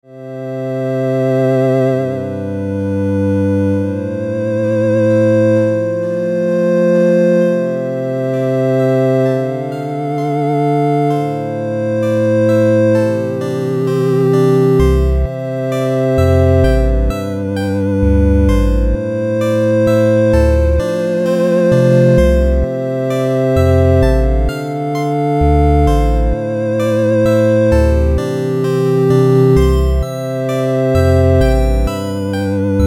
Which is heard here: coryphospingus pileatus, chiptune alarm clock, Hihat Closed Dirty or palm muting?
chiptune alarm clock